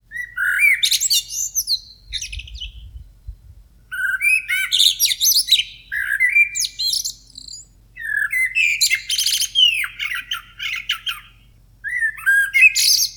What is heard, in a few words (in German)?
klingelton amsel